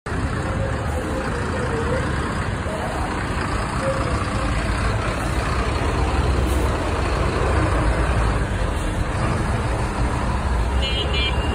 Benz bus

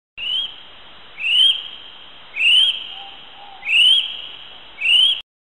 Kiwi; پرنده کیوی

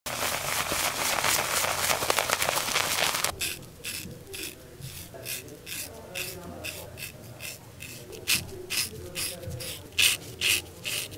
shaving face

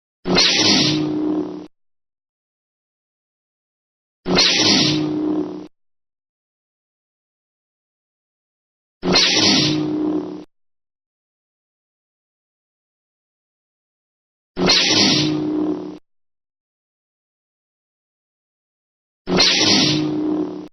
lightsaber

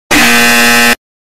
Lie detector

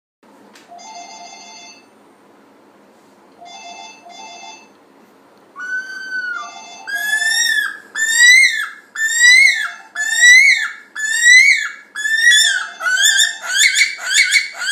Great stone curlew; چاخ لق هندی

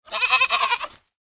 Goat sound

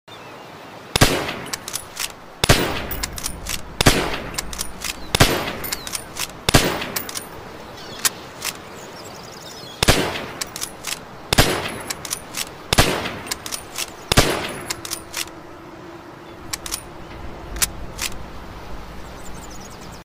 Arisaka, تفنگ; تفنگ آریساکا

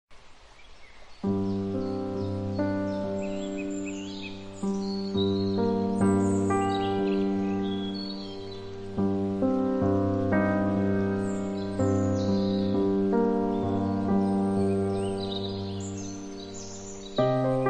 Forest and piano

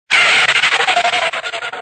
Cartoon braking

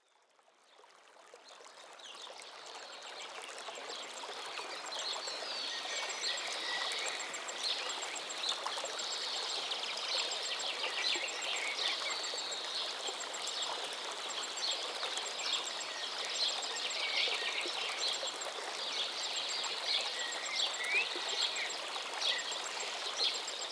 birds singing calm river

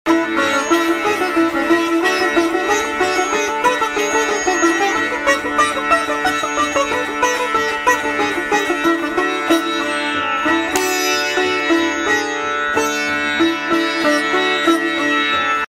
Sitar

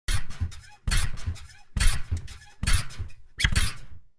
trampolining; ورزش; ورزش ترامپولین

